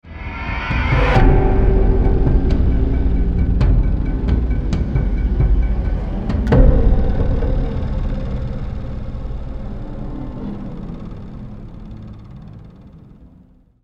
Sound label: Stinger